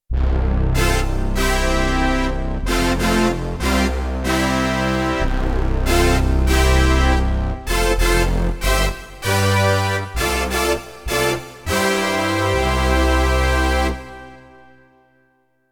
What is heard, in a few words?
Roland D-70 Fuzz Brass; Factory Performances